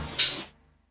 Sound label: HH, Dance Mega Drums; Best Service; HH HIP